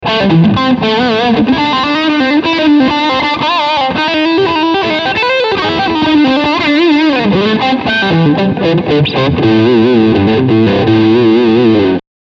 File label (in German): Leadsound Steg SC